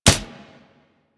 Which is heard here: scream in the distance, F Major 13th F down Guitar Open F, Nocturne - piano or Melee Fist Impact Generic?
Melee Fist Impact Generic